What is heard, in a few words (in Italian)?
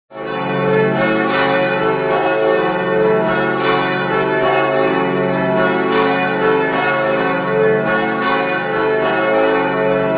Campane a festa